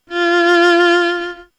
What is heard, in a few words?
violin; violin2